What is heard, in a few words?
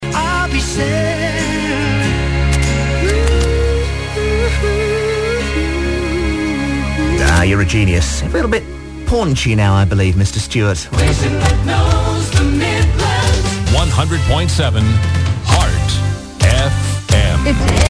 jingle; heartfm